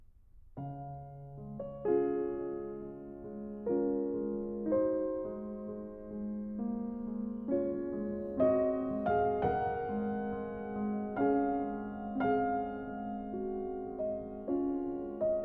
Préludes, op. 28 - No. 15 Sostenuto in D-flat major, Piano; 24 Préludes